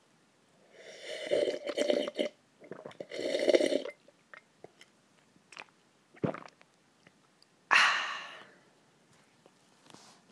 enjoying a nice cup of tea

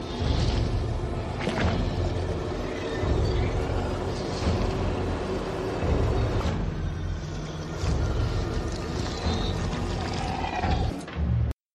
liquid metal morphing; Terminator 2

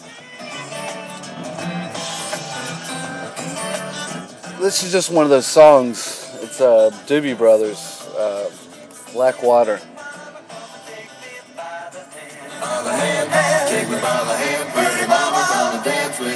warm fuzzy music

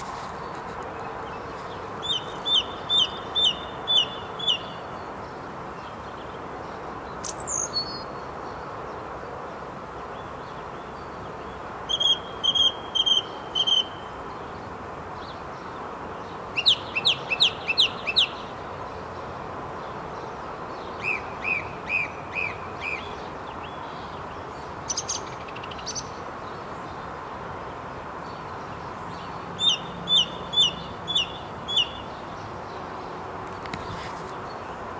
which bird is singing this song

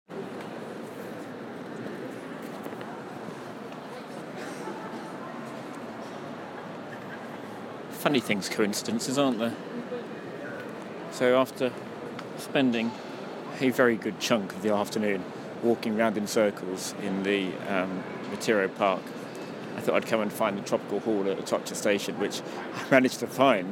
tropical trainshed at atocha; madrid 2016

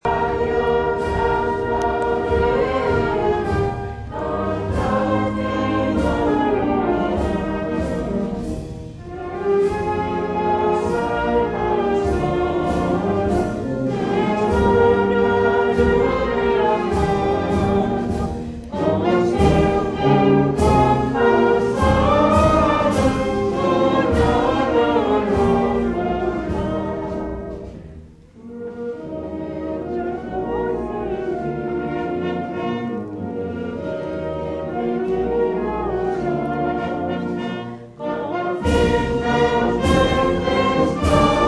banda, himno galego; as pontes

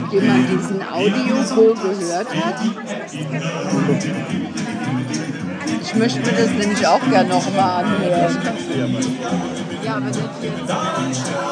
acapella

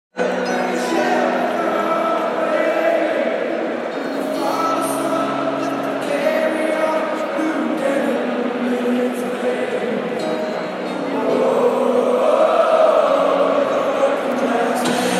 93 000 sing at mcg; after Australia clinch the cw

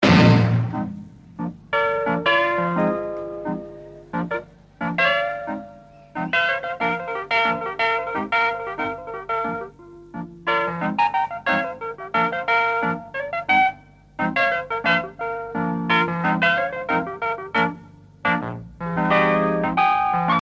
wobbly Rhodes

